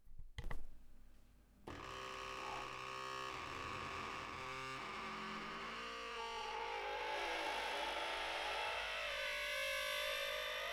Porte qui grince toute une série; Bruitages

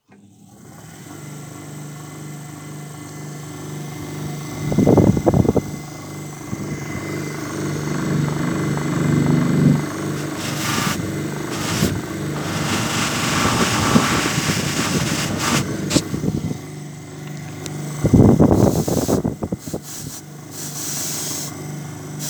Bruitages automate brosse chaussure

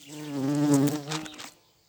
Bourdon jaune montee; Vols Bourdn abeilles

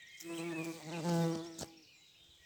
Bruitages Bourdon Envol; Vols Bourdn abeilles

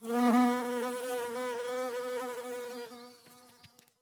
Bourdons beau massif si; Vols Bourdn abeilles

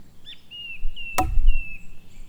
Baton creu, PercuBois

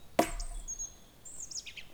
3x Coups secs Ressort Legere Reverbe; Percu Bois

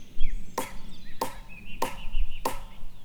Baton creu Tocs Aïgus; Percu Bois

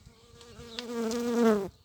Abeille se pose FORT; Vols Bourdn abeilles

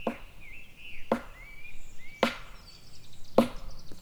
4x Batons Secs, Percu Bois

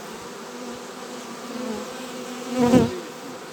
Vol d abeille petits passage bref; Vols Bourdn abeilles

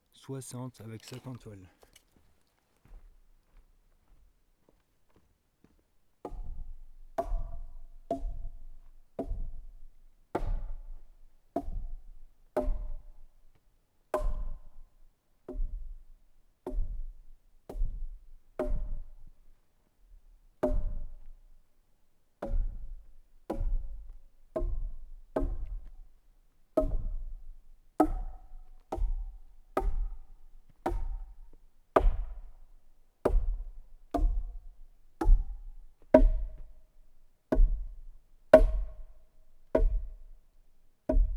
Gourdin Buches sac en toile NUIT; Percu Bois

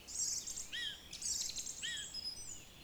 2x tigidituu, Oiseaux

